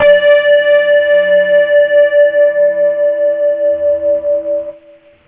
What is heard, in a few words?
harm, guitar singlenotes